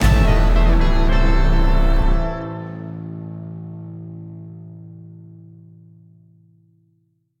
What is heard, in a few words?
Sting Fail Slam